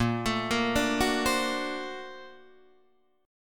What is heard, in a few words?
Bb Augmented Major 9th Bb down Guitar Open D